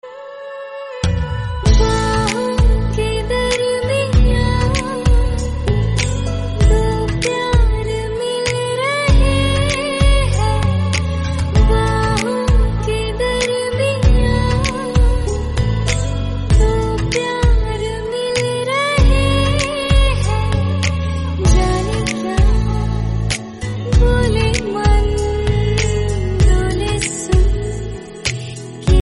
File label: hindi mashup tone ringtone